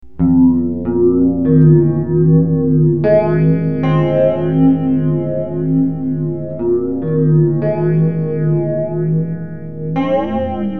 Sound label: chorus Washout